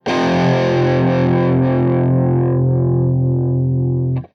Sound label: f Power Chord 3 Notes, guitar